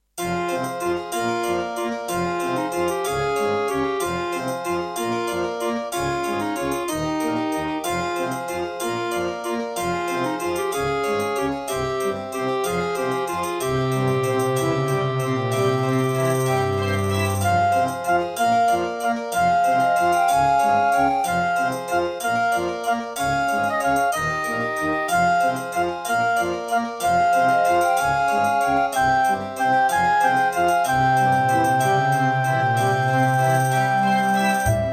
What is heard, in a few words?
Track 6, Roland SC-88 Pro; Death Gate